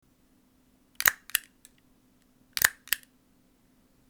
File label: stapler